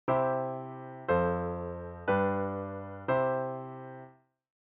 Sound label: pedal; C F G C